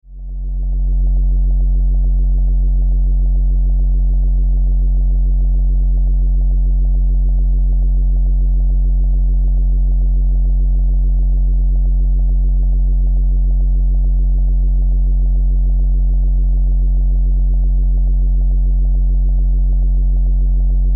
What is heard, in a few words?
Energy Source Pulsin, spaceship fx